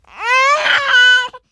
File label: cry, baby; AKAI S-Series CD-ROM Sound Library VOL-6